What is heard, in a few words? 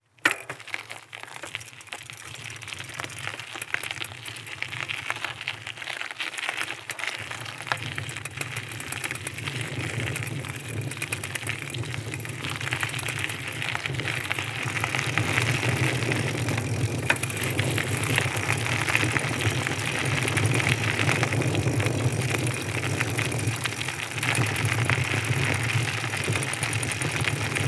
Bicycle, Chain, Perspective, Gravel, Fast, Speed, Skid; Rzpost; Ride on Bike